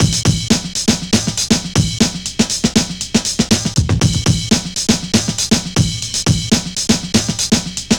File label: drum